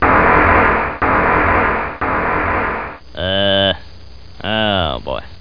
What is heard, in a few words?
damage; butthead; items